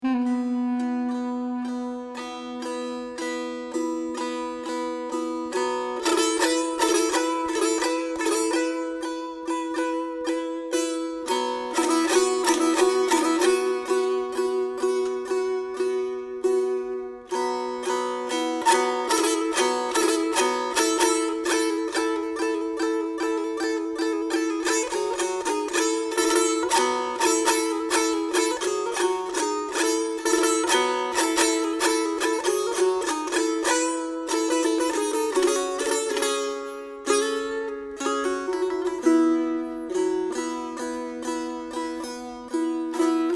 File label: Dotar Solo